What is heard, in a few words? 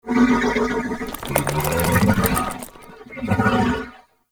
Horse Idle Two; Warframe; Duviri Horse; Vehicles